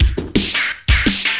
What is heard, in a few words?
loop, 170 BPM, drum & bass